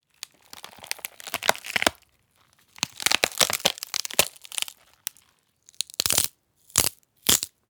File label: Breaking Bones